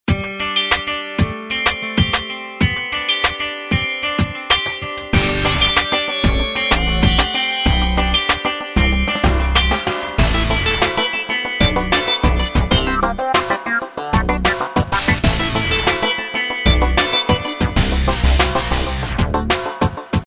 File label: Time Pad; OT-660